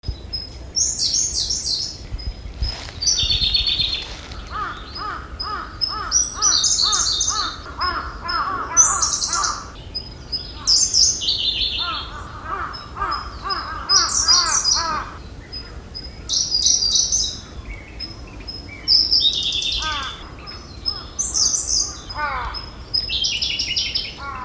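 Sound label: koruri, Siberian blue robin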